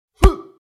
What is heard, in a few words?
Martial arts punch and grunt male